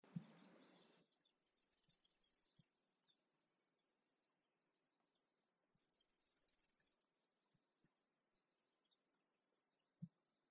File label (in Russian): penochka blednonogaya phylloscopus tenellipes